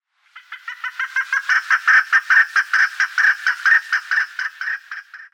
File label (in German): Chukarhuhn Alectoris chukar; Vogelstimmen